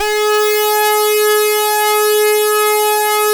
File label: SAW RES DRY, POLYRES SAW; InVision Interactive; Keith Emerson; The Most Dangerous Synth and Organ